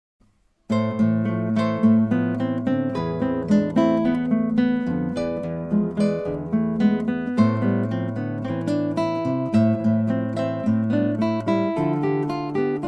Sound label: RockTango Trio